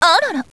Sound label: combobreak; Bowsette- C6